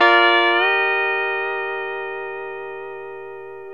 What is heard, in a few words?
A# TO D#, Pedal Slides